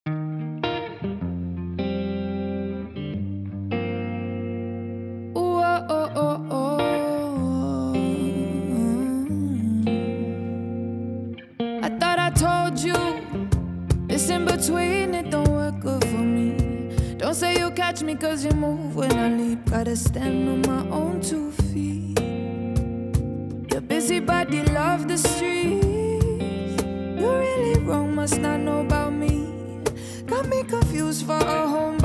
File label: acoustic